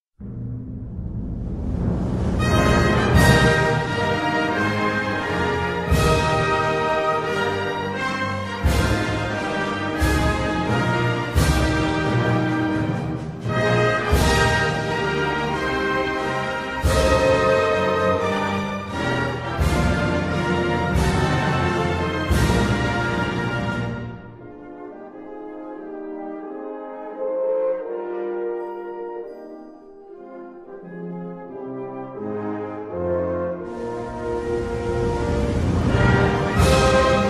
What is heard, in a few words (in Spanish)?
Himno nacional de Surinam Instrumental